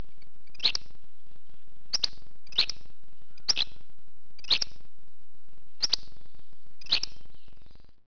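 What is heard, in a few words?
flycatcher; Webster's International Encyclopedia 1998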